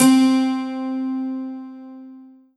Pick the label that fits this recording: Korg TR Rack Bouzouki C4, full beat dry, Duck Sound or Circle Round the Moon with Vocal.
Korg TR Rack Bouzouki C4